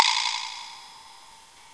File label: Clicky